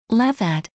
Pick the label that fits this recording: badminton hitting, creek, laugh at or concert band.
laugh at